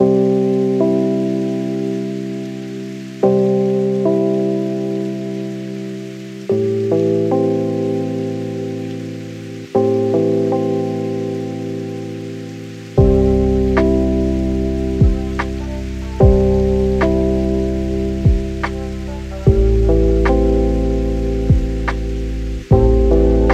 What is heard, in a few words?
Sad Lo-Fi